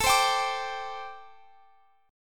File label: A Minor 11th A Guitar Open F; x,x,21,19,22,21; strummed; Am11